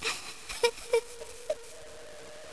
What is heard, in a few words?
weeping